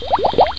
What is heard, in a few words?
Button